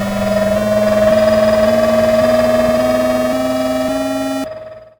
vaccinator running; Machines

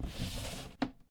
shoji door open; Vehicles

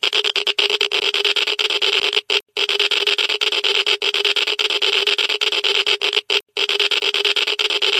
high, geiger

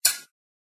crowbar

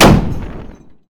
bushmaster 35, Guns Fire; Weapons

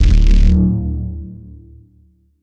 rad pulse

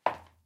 heels clack, Footsteps

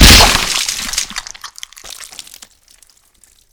chop, Gore

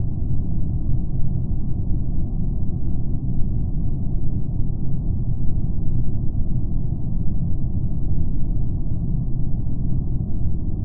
ship ambience; civ13; civ14

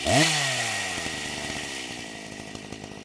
chainsaw; Weapons

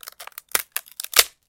diona scream; civ13; civ14; Voice

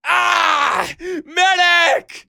medic hurt; Voices Emotes

